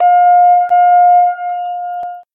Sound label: elevator down